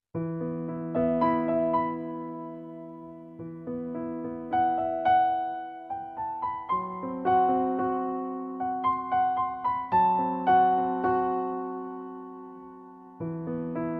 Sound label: Piano; Mucize Doktor Muzikleri